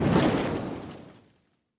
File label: Fire